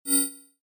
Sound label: Rollover, Short; Mouseovers; Multimedia; Stuart Duffield